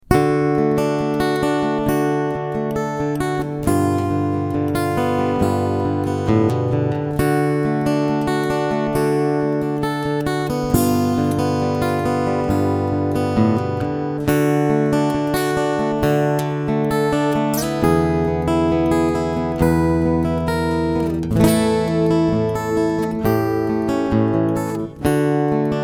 moon song

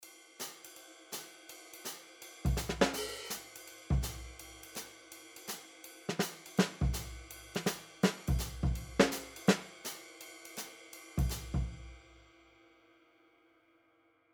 Modern Jazz Sticks; Addictive Drums 2

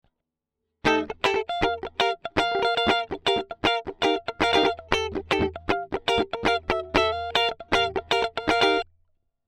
e git fast; Dave Hill Designs Europa 1

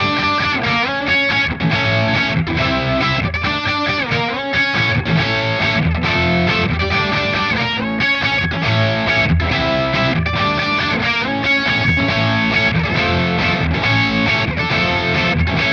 Fender vs Harley Benton Fender bridge crunch

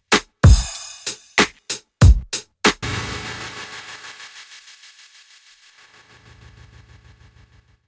rev Break Rev

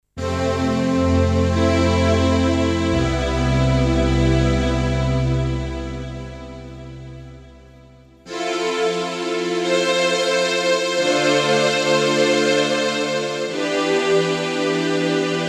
PP 3 Strings; Gewa

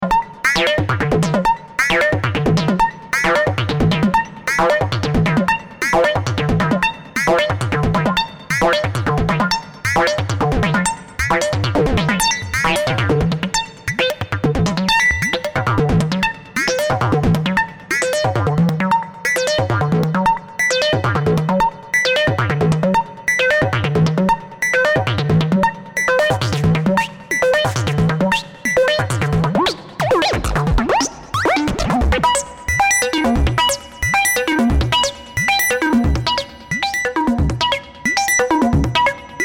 FM Snare Bleep

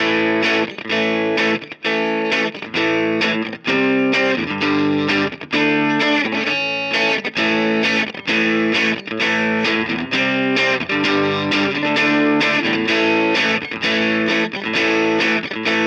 Nux MG-30 Vox AC30

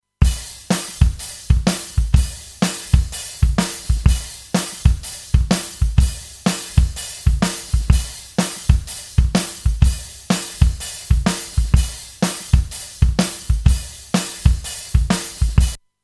Mäag Audio Drums EQ

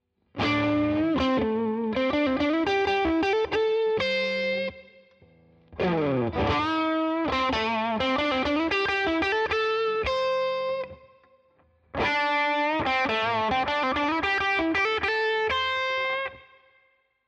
mid gain All positions Lead; Cort MBM-2H

